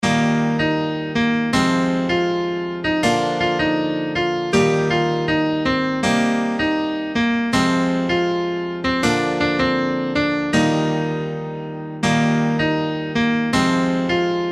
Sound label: instrumental